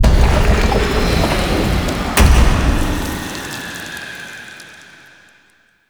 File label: Tomb Urn Pillar Raise; Mummy Tomb